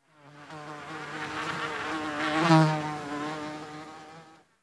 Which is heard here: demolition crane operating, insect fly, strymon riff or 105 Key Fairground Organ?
insect fly